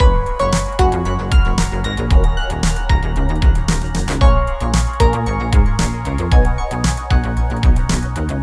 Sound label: wiibrew banner loop part